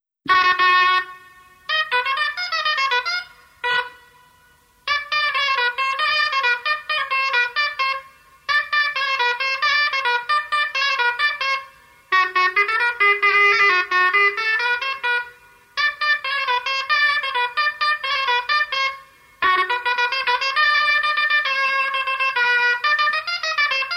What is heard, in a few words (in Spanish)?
Paloteo; Paloteo "Trincheras"